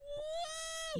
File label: woaaaaaaaaaaah